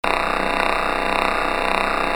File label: Inner Race Bearing Fault; Wireless; InsightCM